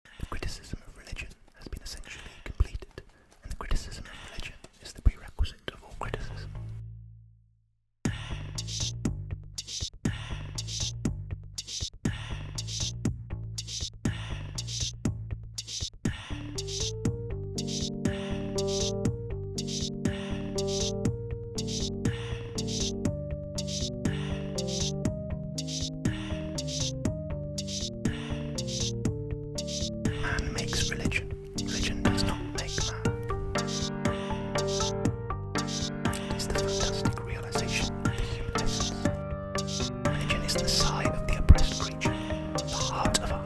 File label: comrade asmr